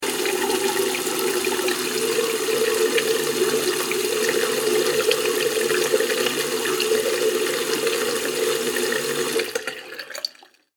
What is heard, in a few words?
Water Filling Bathroom Sink